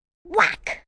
Pain Whack, Grunt, Impact, 1 child; VoiceBox

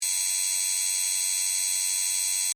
Laser Saw Cut Beam; Science, Fiction; BlastWave

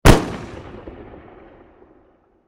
Warfare Pistol Glock 23 Single Shot Exterior; Pistol Sound Effects; Pistols (Sound Effects:Guns); SFX Bible 6

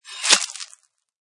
Knife Slide And Stab; Knife or dagger scrapes and stab into flesh; Lynne Music

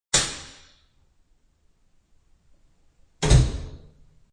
Cosmetic cabinet above sink opened and closed.; Bathroom Sound Effects; Household

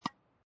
Tennis Hit Ball with Racket Distant; Sports

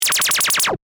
Laser Machine Gun, Fire, Shot, Science, Fiction; Scifi; Blast Wave

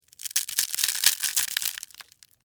Crunch Ramen Noodles; Texture

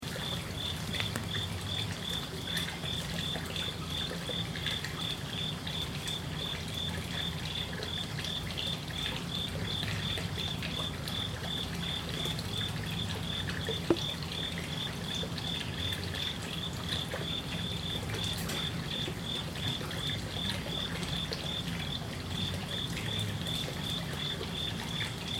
Koi Pond Night Cricket Magome Japan; Magome, Japan, koi pond night time ambience; Japanese garden, water babbli; Cricket Sound Effects

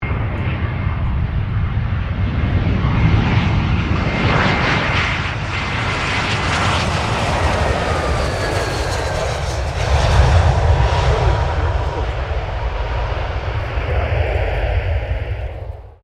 1995 A320 AIRBUS TAKEOFF; Airplanes; Vehicles

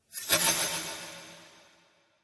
Magic Illusion; Magic Sound Effects; Magic - Illusion 01; Role Playing Game

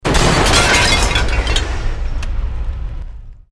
Explosion Medium Metal Glass; house, building or vehicle effects; Bombs; Created explosion effects; SoundEffects Foundation2

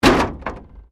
Wreck Door Panel Clunk Metal

